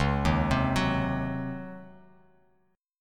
C sharp Major 7th Suspended 2nd Suspended 4th Bass Standard; C#M7sus2sus4 arpeggiated; 9,6,10,11; JGuitar